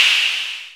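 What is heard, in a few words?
percussion clap, Analog Drums; Roland - Rhythm Section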